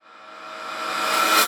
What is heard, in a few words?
Transition Reverse Whoosh Reverse; Production Element